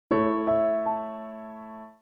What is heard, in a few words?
Fold open; Nokia N92